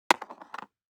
DICE on Cardboard, Throw and Roll, Standard, 1 One Die; Samples; ArticulatedSounds; DICE Sfx Party (Extended)